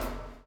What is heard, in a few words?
CHAIN; Spectrasonics - Backbeat